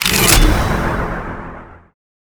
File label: bonus alert start; Counter-Strike Global Offensive